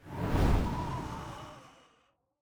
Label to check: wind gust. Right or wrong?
Right